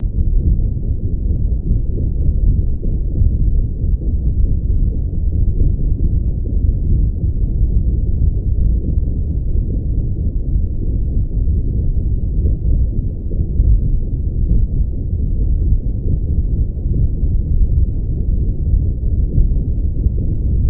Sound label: Propeller Movement Underwater